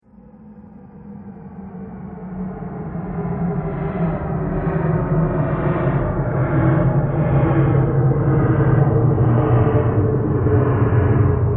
Spaceship Landing Engines, spacecraft sound effects